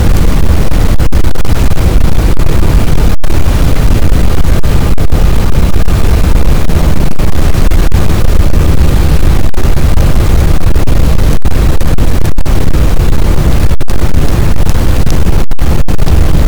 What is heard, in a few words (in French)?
cachalot positif, boat, Physeter Macrocephalus